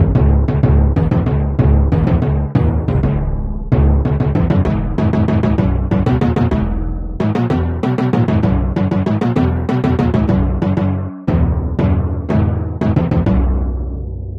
Drum

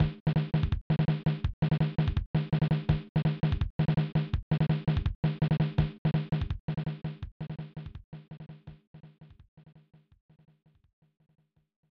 Drumbeat; fun n games 1994 snes

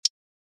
choose

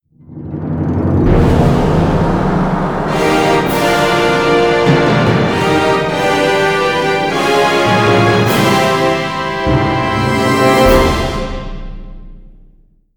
Battle Victory; Khan

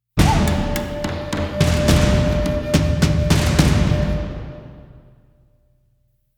Combat Out; The Witcher 3 Wild Hunt

